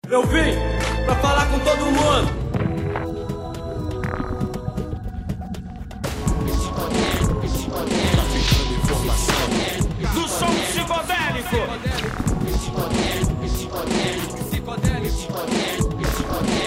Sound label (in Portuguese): intro rap